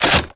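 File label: Reload Charging Handle Forward, weapons; Valorant; odin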